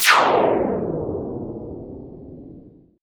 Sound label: Sweeps Down